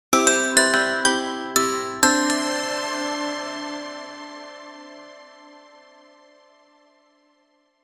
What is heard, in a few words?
a video game win sound, chinese